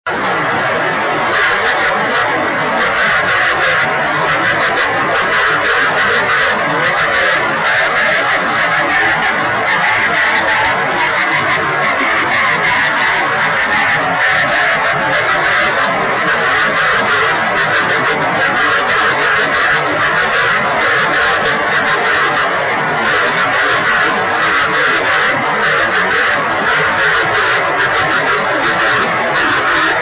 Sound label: 24G beacon; 雨、気温１３℃ 湿度７０％; JH1UGF→六本木ヒルズビーム JN1AYV←ＪＲ川崎駅水平ビーム レインスキャッタ; JH1UGF 横浜市; JN1AYV 茅ヶ崎市